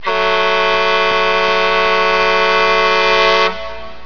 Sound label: TRUC HORN